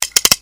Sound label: spin; jb snow